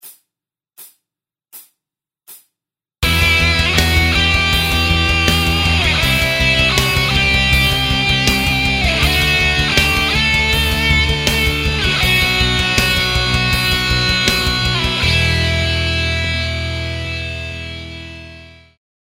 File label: Backing track 4 bars with melody; george stergiou; Creating melodies